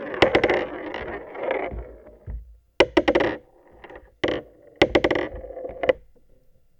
In The Bath, Marbles, contact mic

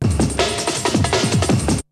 BREAKBEATS, TECHNO

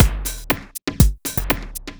Beat, Drums & Percussion; Electrocution; Beats

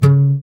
GUI AC, Acoust. Slap; Sound & Vision; Gigapack I CD 2; GUI ACOUSTIC 2

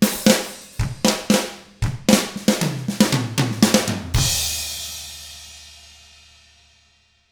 ROCK

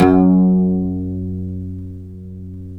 PLK OUD F#; Roland - LA Composer vol. 3

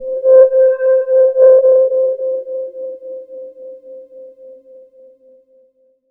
soft sonar; One Shot FX; Sample Magic; Transmission-X

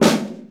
sfx perc

